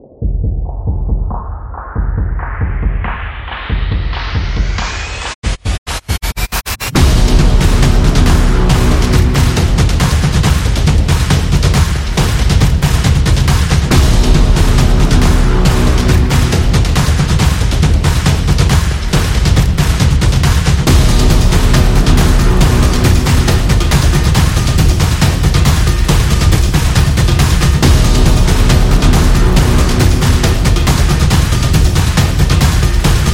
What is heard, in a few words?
Impact Trailer; sport drums